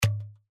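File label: button info; Genies Wild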